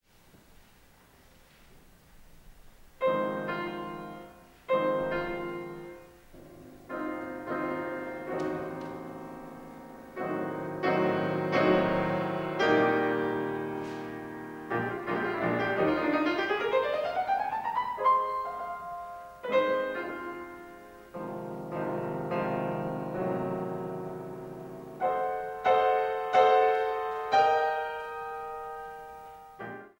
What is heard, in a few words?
Sonata in E flat Major Allegro LIVE, Piano; Beethoven; Op31 No3 I